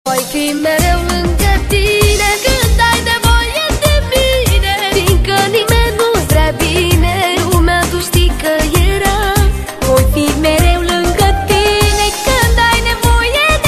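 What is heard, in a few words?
baby laugh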